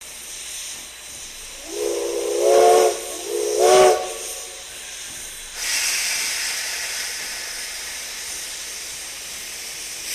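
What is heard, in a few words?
Steam Train Steam Blast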